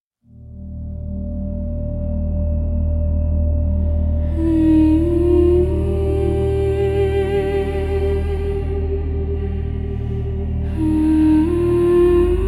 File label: Neck Rolls; Wahoboom